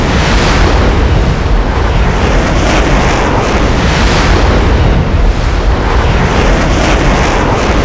flight loop, flyer; Quake 4 Alpha; id software; strogg

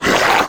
imp pain, monsters; DoomRTX; imp5

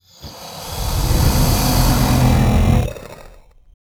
pinky chatter, monsters vocalizations; DoomRTX

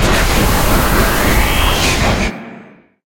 turret startup, weapons; Quake 4 Alpha; id software

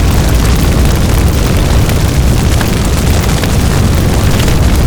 fire deep; Quake 4 Alpha; effects fire

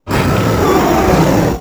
pinky sight; DoomRTX

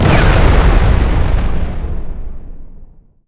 space explo; Quake 4 Alpha; vehicles; strogg flyer

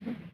fat woosh miss; DoomRTX

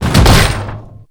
Pinky Bodyfall; DoomRTX

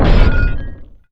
glass crack; Quake 4 Alpha; id software; strogg; tik

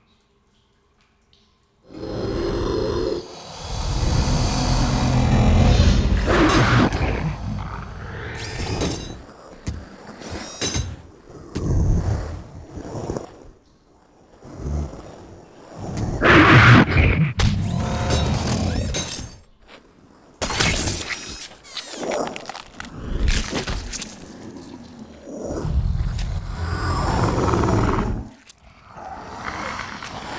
bathroom; DoomRTX

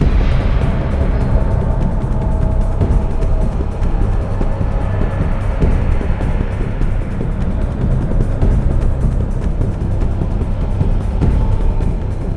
hangar, music; Quake 4 Alpha

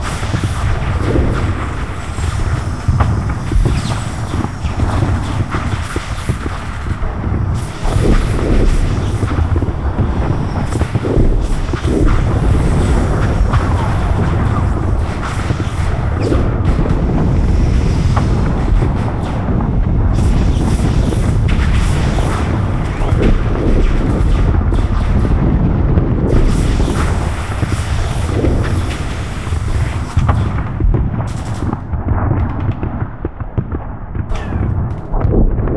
medium battle loop; Quake 4 Alpha

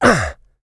pain light, player; DoomRTX; small

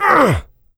pain light, player; DoomRTX; medium

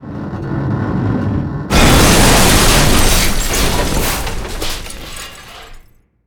lift break off; Quake 4 Alpha; id software

